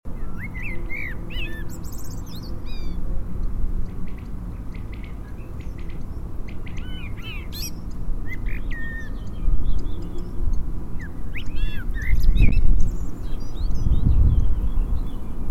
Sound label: Turdus merula; SETE